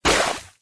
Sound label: obstacle; Orange Crash